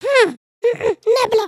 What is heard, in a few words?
excited, emotes